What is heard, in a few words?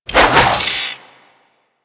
Movement Flip Whoosh; Enemies; Warframe; Kela De Thaym